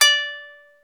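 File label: ethnic plucks; Eth Plucks, Pluck Duo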